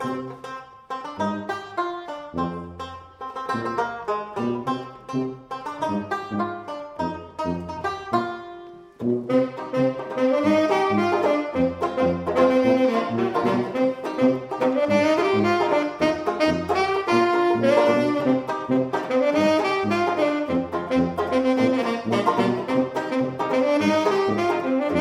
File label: banjo birds